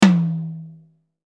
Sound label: Tom Original